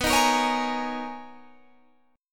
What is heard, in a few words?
B Major 13th B down, guitar; strummed